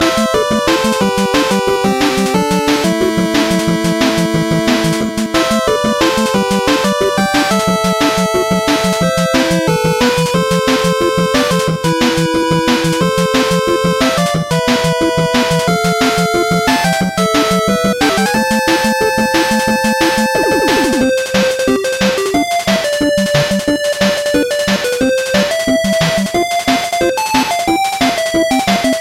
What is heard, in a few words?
Fireball Strike